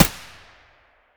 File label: aps muzzle distant; STALKER; IWP